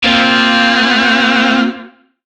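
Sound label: Shawzin Pentatonic Minor Chord; Warframe; Lizzie Shawzin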